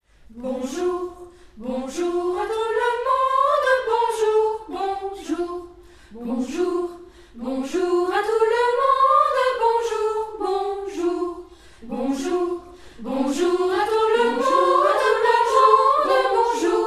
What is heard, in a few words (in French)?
bonjour polyphonie